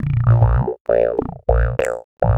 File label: philter (phunk); Sonic Foundry (Sony Creative Software); Ugly Remnants VOL-2